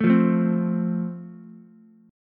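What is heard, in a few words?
A Minor Sharp 5th, Ukulele, chord strum; Am#5 strummed; 2,5,5,3; JGuitar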